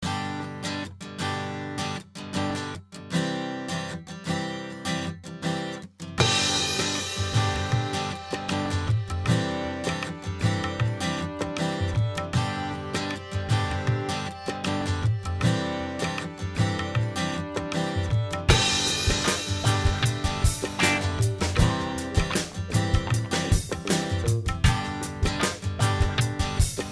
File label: pop guitar